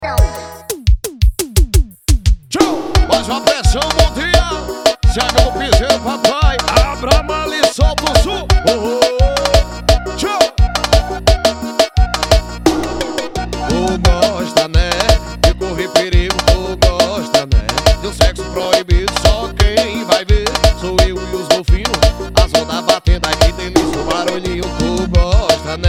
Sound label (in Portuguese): esp sertanejo